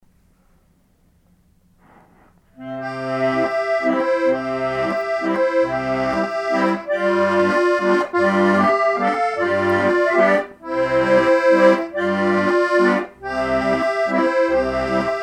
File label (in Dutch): Pletvals; Potpurri; niederl. frz.